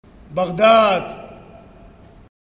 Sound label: loop